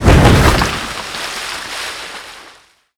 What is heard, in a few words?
zodiac crash impact; Call of Duty Modern Warfare; physics